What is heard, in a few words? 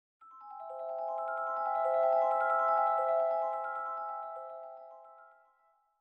rhythm